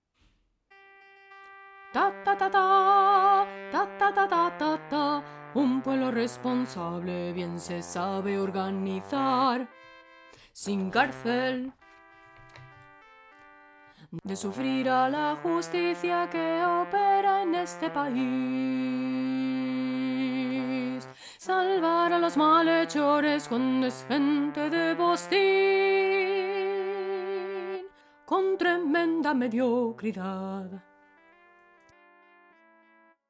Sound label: bass